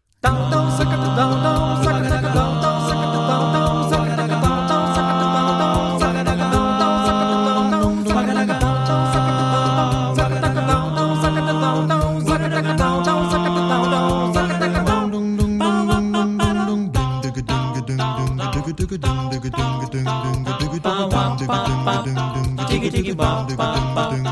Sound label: vocal ensemble